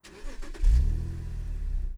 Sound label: CAR Start Engine By Tailpipe; mono; adams venture origins